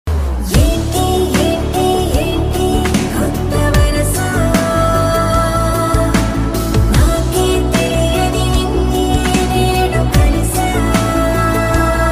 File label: south indian bgm ringtone tamil best bgm ringtone instrumental; geethagovindam